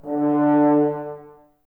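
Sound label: Brass Horns D; Sample CDs; Miroslav Vitous; Woodwind Brass Ensemble; F.Hrns Links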